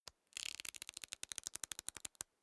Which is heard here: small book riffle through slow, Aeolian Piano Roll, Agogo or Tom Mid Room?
small book riffle through slow